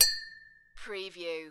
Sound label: Wine glass clink, two wine glasses clink together in a toast cheers